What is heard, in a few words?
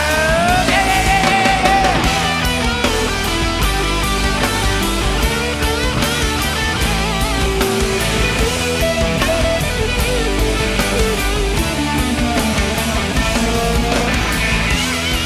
solo